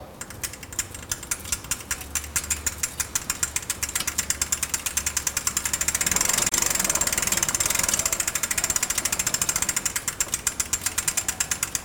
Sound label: Bicycle Wheel